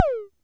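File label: mattel tom, Mattel Synsonics drum kit, lo fi; 8 BIT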